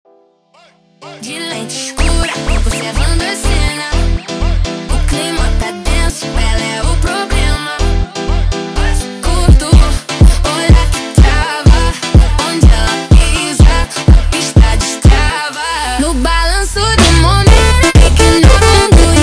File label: Montagem slowed